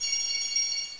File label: Magical Tinkle; Death Blade